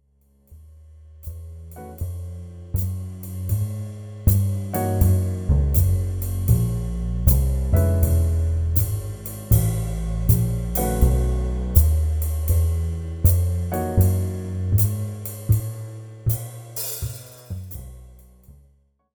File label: Blues, 80 bpm